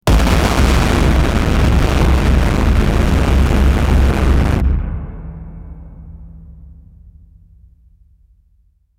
loud deep war drums into, horns

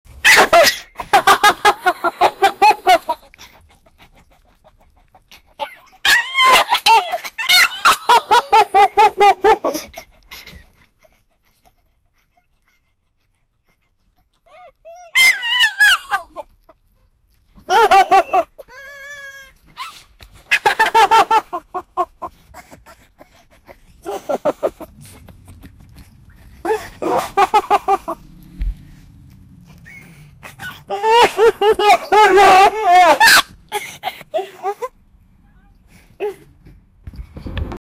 a 18 year old young, laughter

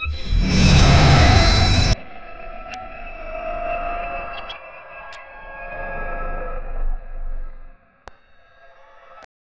camera fall truth; reveal

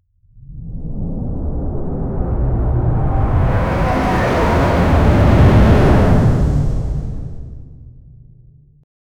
the overloaded sound of a, spaceship

